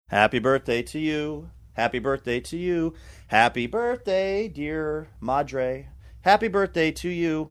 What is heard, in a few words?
singing happy birthday voicemail; mothers